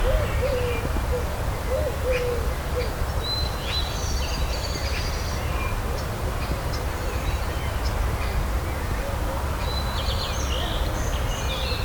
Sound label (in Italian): nel parco fermi; Se ti fermi si può sentire il vento che muove le foglie.